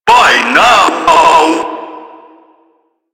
Proto Infested Ancient Tentacle Swing; Warframe; Abilities

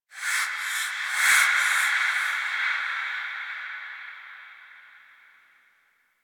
Breaths; Ambience; Warframe; The New War; Quests; Zariman

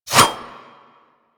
Dagger Whoosh; Dual Daggers; Weapons

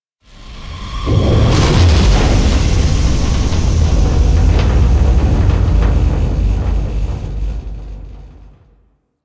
Solar Flare; Warframe; Quests; The New War

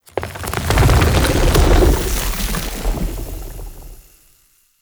Root Growth Bridge; Puzzles; Bean Stalk

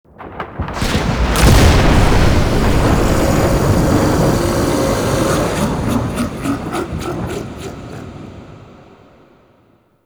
Murmur Exterior Thunder Clap J; Warframe; Ambience; Duviri

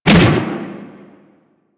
Fist Whoosh; DE; Warframe; Weapons; Archwing; Melee; Grn Arch Regor Fists

